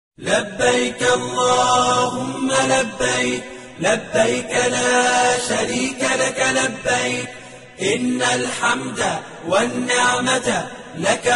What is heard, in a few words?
labbaik allah humma labbaik ringtone islamic tones